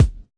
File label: kick, Roland MC-909